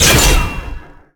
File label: Block